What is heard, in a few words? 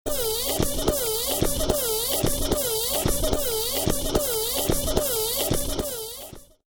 pump dry